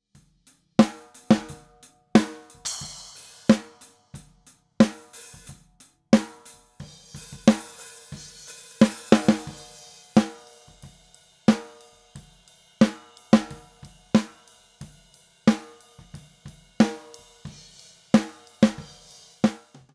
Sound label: Snare